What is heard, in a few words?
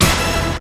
hit